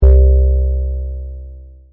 ppg bass

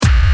hard dnb kick

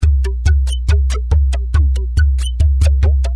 140 tek

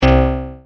ppg bass

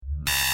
concrete

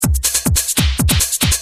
cutting, rythm loops

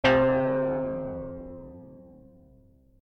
grain piano C

